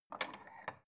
bowl take soft

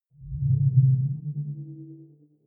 sci-fi display transmission submerged

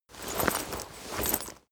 leather metal handling short

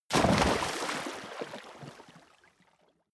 body fall water deep

